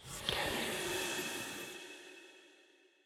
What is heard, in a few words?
scatter Sym Landed; goldengrimoire